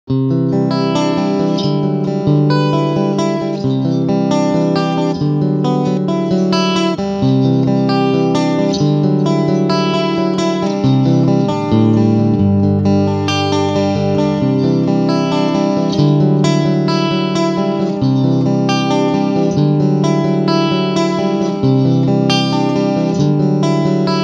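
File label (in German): Front Humbucker und Singlecoil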